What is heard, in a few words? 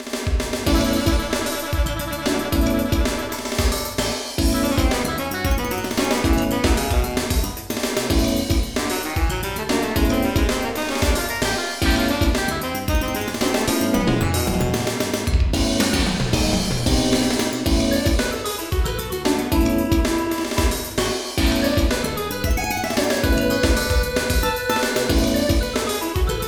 Funk